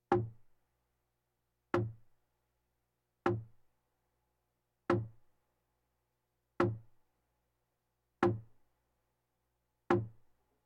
Percussion Bongos Tympani rolls Gongs Drums

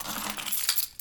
Pickup Keys; SFX Kit; Foley - Misc

